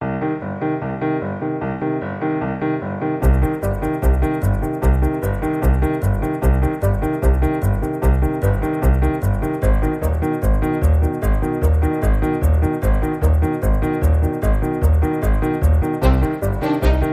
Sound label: Traditional Percussion